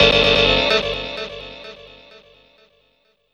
GTR, Hip-Hop & R&B; USB Soundscan vol.47; AKAI

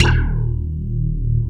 wet bass; Wizoo - Powered DX

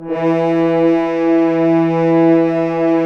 BRS, FHns Dry; Roland L-CD702; F.Horns 2

